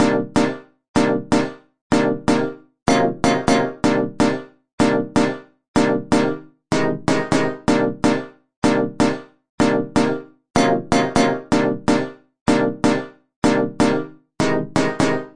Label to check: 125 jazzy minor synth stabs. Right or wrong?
Right